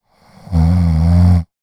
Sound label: human female snore single; zapsplat